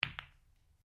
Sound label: Billiards Ball Ball, Home billiards table; Pool; Sports